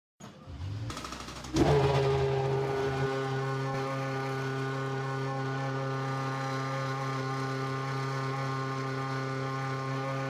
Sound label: F1 V8 Idle Sound